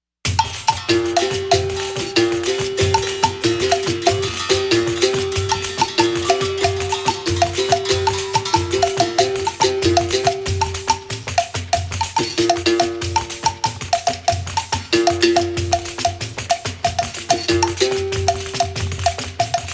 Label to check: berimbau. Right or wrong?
Right